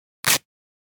Cloth Split Rip Tear - Quick - Foley Cloth Movement; Hollywood Edge; sound designer's toolkit v2